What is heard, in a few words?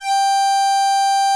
ME ST G5, ANA STR